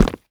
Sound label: land tile, footsteps; player